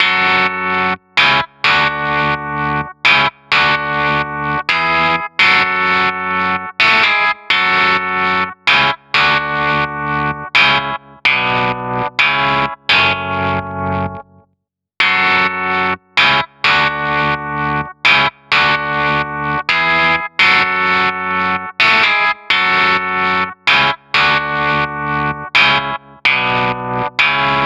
Guitar, 128 BPM; Vengeance Samples; VTDS2 Song Kits

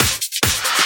Loops Fills; Vengeance Samples

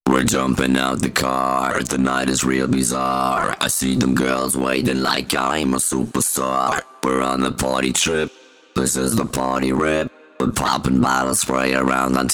Pitched Vocals Vers WET, 140 BPM; Vengeance Samples; Song Kits

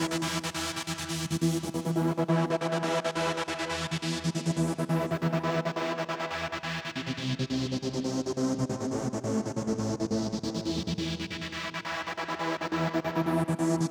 Trancegate 138BPM, E minor; Bassline & Melody; Vengeance Samples; Freefall Kit

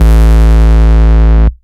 Bass Root A

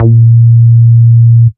Root A#, Ultimate Bass; Vengeance Samples

